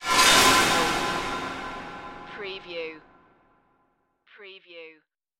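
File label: Production Element Hit Cymbal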